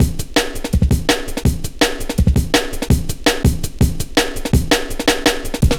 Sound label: Cool, Drum Loops, 165.5, Jungle; Zero-G Jungle Warfare 1, 2 & 3